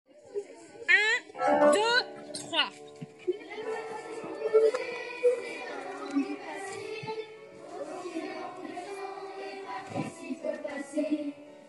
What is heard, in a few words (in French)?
Chant passé composé